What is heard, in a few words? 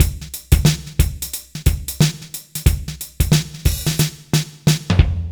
bpm 90 amb; AKAI S-Series CD-ROM Sound Library VOL-4